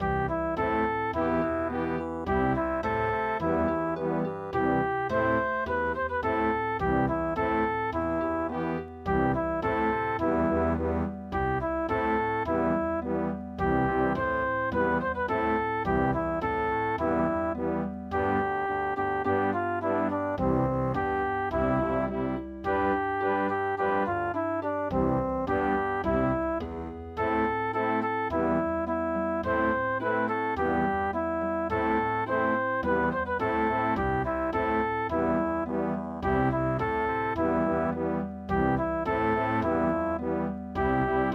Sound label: The volga boatmen Vienna Waltz